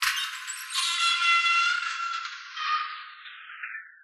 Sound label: Mechanic Squeak